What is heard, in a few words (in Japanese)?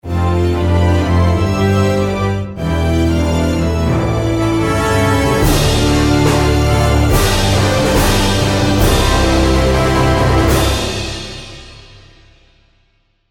kando jingle